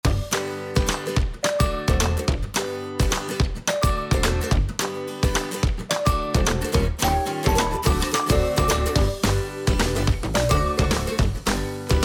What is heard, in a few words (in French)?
loop musique